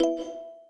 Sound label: alert; zimbra